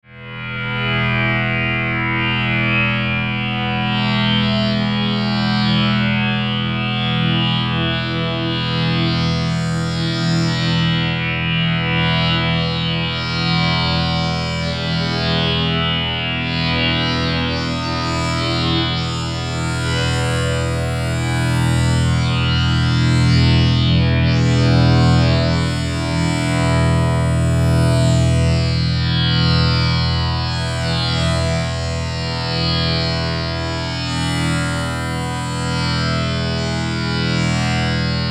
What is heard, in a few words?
Drone Dark Musical Tonal Synth Swell Phaser; Sound Design; Blastwave